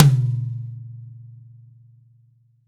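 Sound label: TY Mid Tom, Roland BK-5 Toms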